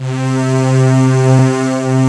JD990

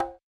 RX8 Cga HOP, Yamaha RX-8

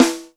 Snare, Alesis DM5

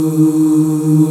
VOX D#2, Vox Percplex'd

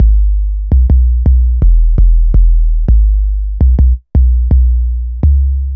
808 Standard, Bass Lines 166.5; Jungle Warfare 1&2

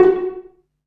PIZZ, Dance Mega Synth

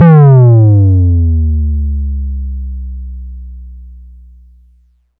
SYNTH TOM F2; Best Service Alpha Dance vol.2; SYNTH TOMS

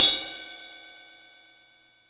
Ride Cymbal Cup, Yamaha PSR E305

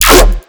BASS SHOT

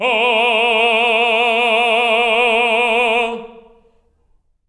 TENOR F A#3; Spectrasonics; Symphony of Voices

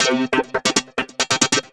pure synth, techno, 138, c; Techno ID; instrument-loops

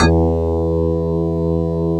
METAL BR

